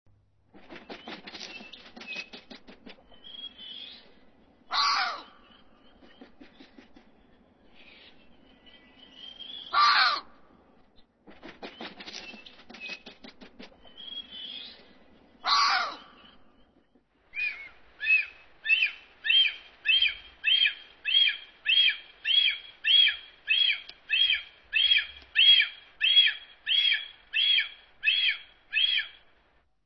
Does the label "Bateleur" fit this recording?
Yes